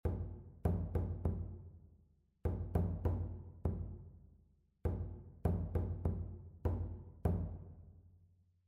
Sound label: rhythmic error detection